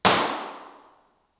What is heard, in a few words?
Large Room Imp Resp 16k